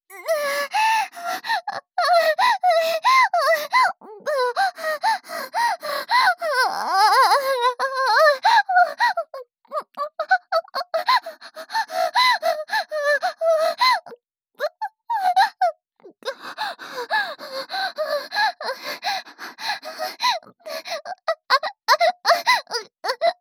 行為に夢中になっている喘ぎ声

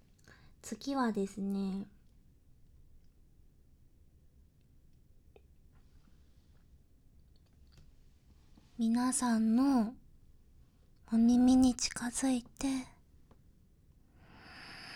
ASMR 匂いをかぐ バイノーラル

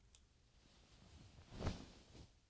布団から飛び起きる

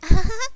あははは

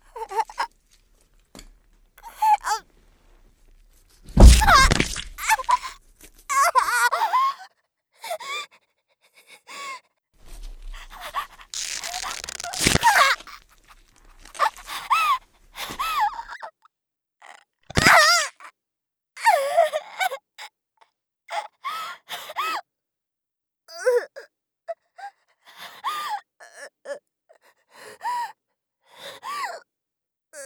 抜歯, 効果音あり

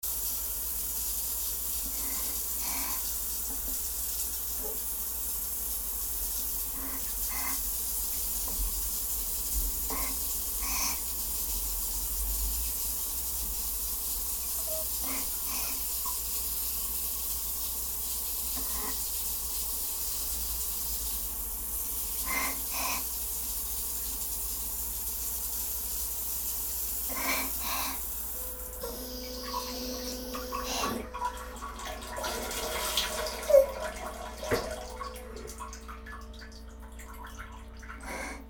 ウォシュレット、はぁはぁ

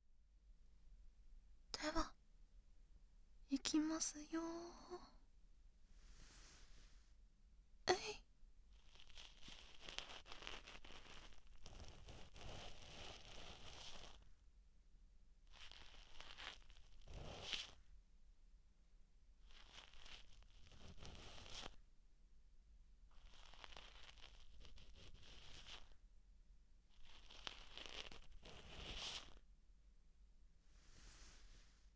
お隣さん、右耳のお掃除をする, ASMR

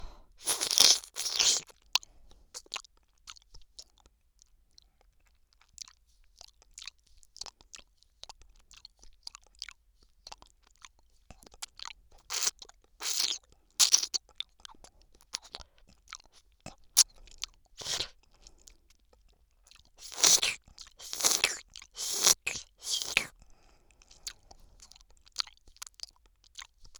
左耳 アイス 舐め

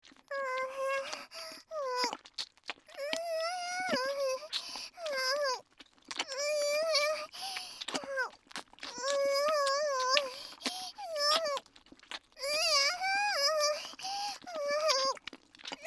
おまけのちびっ子えちえちボイス（フェラチオボイス）

